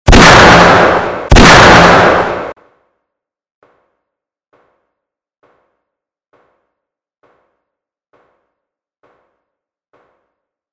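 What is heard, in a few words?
shot sound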